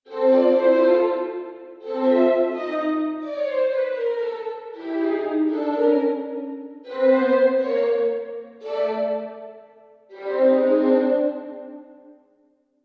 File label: violins